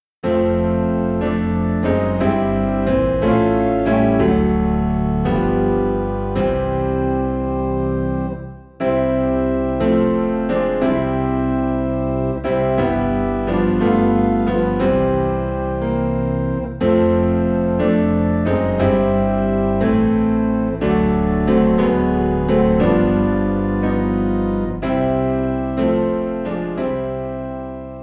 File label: piano and organ low in C